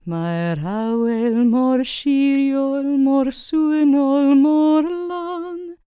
CELTIC, Vocal Planet World Voices; ILIO; Vocal Planet VOL-5

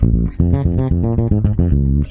A# 114BPM, PEDULLA BASS; Masterbits CLIMAX 7

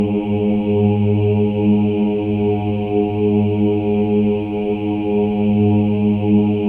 OHS, Symphony of Voices; Spectrasonics

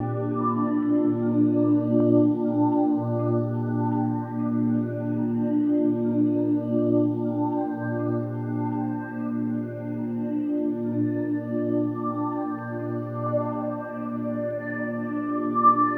Guitar Textures

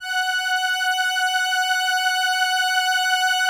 LAMBDA, String Machines

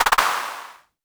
polyvox clap; Formanta Radio Plant

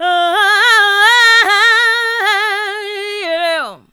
OHA HAN HA, Funky Vocals; USB Soundscan vol.18

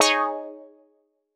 Quart Stab Eb; PowerFX - Nu Jazz House

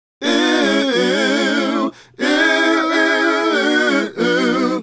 Male Group, Hip-Hop and RnB Vocals

